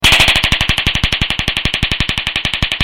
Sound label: taser